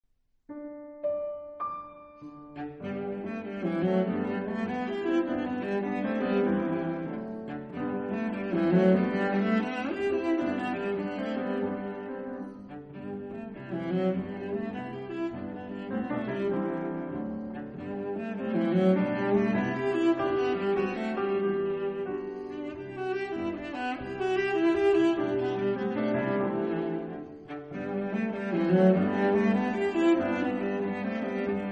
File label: Deux improvisations cello piano